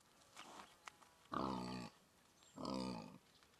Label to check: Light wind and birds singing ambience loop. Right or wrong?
Wrong